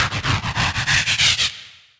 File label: Scratch